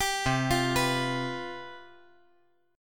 C Major 7th Suspended 4th, down

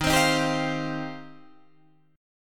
D sharp 6th Add 9th, Guitar, Open D

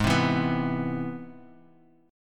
C Augmented Major 7th, chord

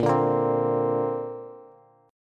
Bb Major Add 9th, Bass; strummed; JGuitar

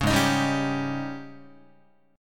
G sharp Major 7th Suspended 4th, Guitar; G#M7sus4 strummed; JGuitar

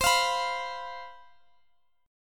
C Major 7th Suspended 4th, Guitar Half Step Down

